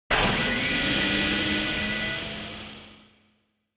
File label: Retro Anime Mech Equip Long